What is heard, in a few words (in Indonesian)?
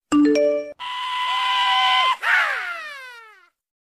nada notifikasi; Suara zombie lucu; Download Nada Notifikasi PlantsZombie TikTok; nada notif Plants vs Zombies viral TikTok